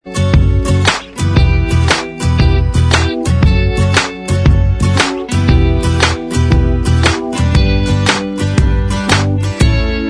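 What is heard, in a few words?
Instrumental Track, backing tracks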